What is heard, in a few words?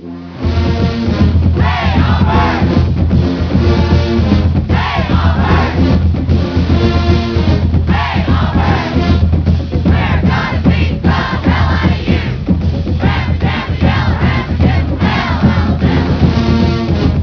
Rammer Jammer Cheer